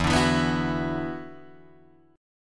E 7th Suspended 2nd, Guitar, Drop D

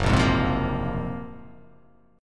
F sharp Major Sharp 11th F sharp; strummed; 2,1,3,1,0,0,0,x

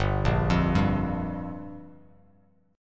G Minor 7th; Gm7 arpeggiated; JGuitar

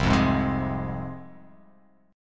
C Suspended 4th Sharp 5th, C, down, chord; Csus4#5 strummed; JGuitar

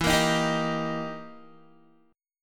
E Minor Major 7th Flat 5th, E, down, Guitar; EmM7b5; strummed

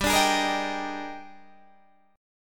D sharp Major 11th, G, guitar chord

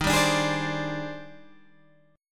Eb Major 13th, Guitar, Standard; EbM13; strummed; JGuitar

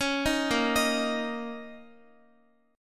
B flat Minor Double Flat 5th Guitar, chord; Bbmbb5; arpeggiated; x,x,11,8,10,10; JGuitar

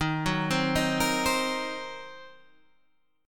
D sharp Minor 6th D sharp, down, Guitar, Standard; D#m6 arpeggiated

